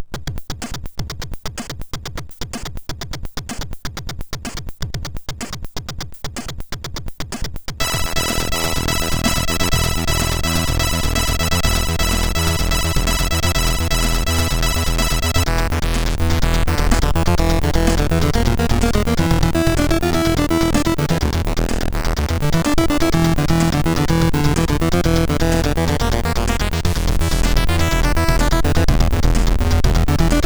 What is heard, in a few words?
sid MOS6581R4, Chiptune; Goat Cheese